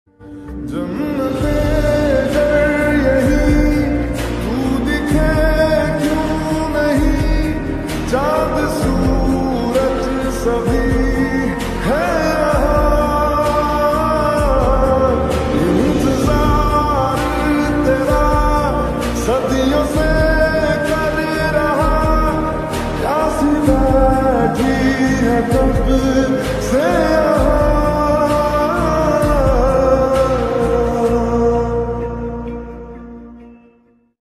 heart touching ringtone sad ringtone

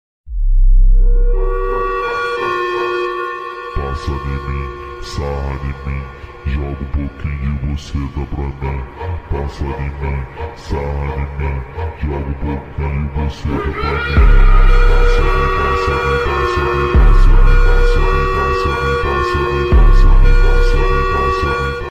beat slowed ringtone english ringtone; mja tones

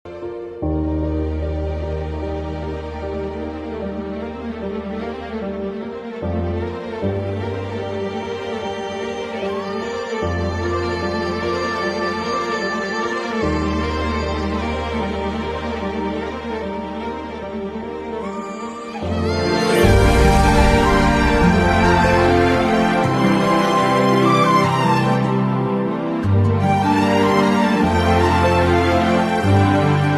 bgm; adipurush

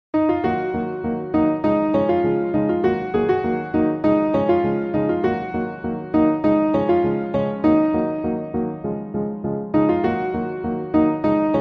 bgm keyboard cover

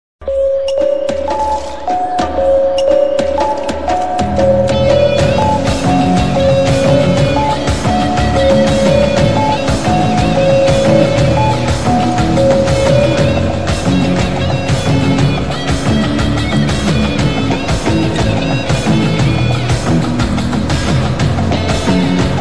bgm bass boosted bgm ringtone; theeratha vilayattu pillai

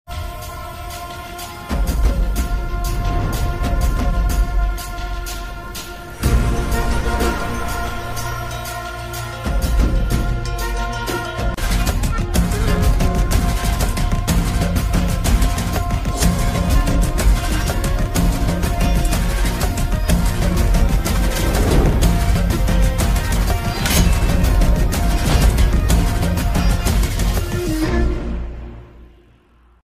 villian bgm; Devara; Bhaira glimpse bgm ringtone; Saif Ali Khan

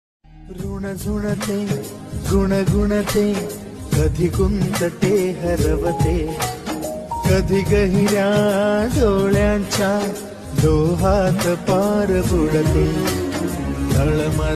marathi songs

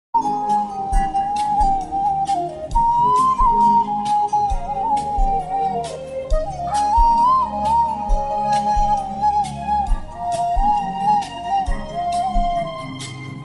bgm love music instruments

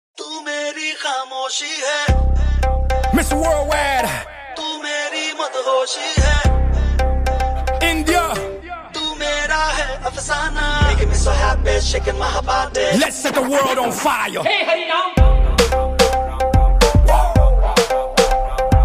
Guitar Ringtone Love Ringtones; bhul bhulaiya 3

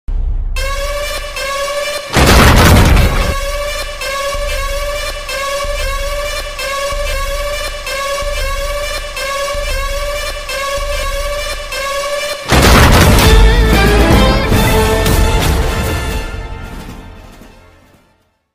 mass bgm

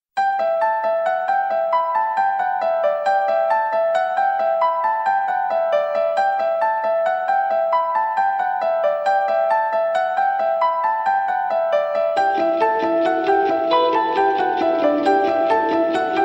anime ringtone; death note l

